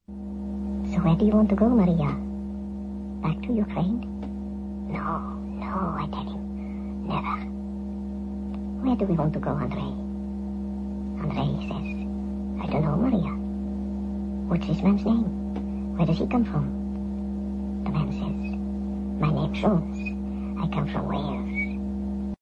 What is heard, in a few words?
piano tracks sample orig